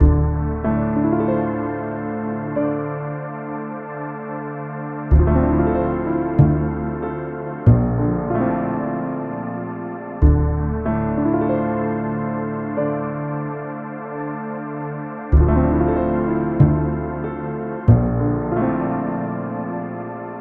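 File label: Full D minor 94, Lo-Fi; HipHop; Melody Kits; GhostHack; Ghosthack - AC2024 - Day 5 - Melody Kits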